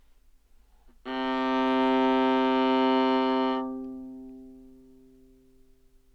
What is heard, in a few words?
modern viola open g, Anechoic; OPENAIR